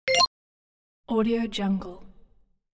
cute accepted sound

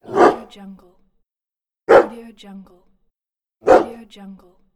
dog barking single sounds

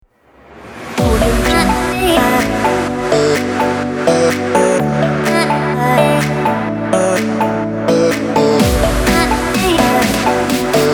party mood

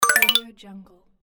achievement

